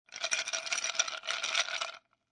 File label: Ice Cubes In Glass Cup, Jiggle And Shake; Foley; Shaking